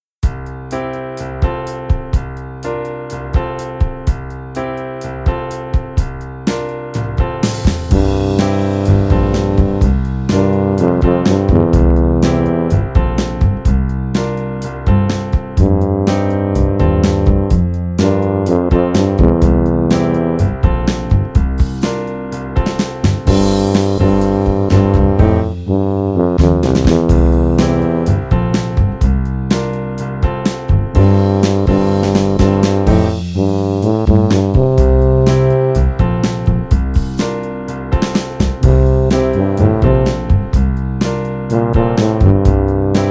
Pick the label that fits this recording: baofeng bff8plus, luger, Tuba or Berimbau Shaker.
Tuba